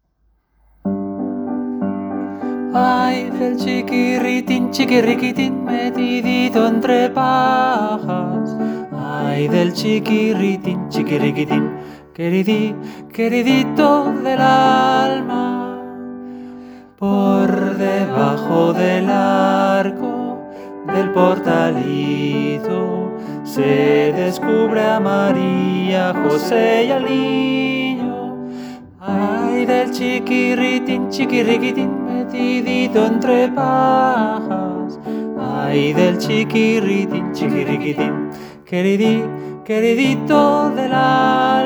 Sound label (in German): Männer; Ay del chiquirritin